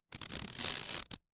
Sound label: cartoon stretch